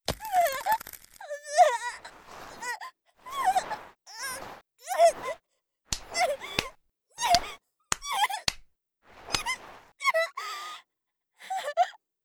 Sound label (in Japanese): 振り払えず固まる; 効果音あり